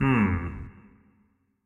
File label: Grunt; Marv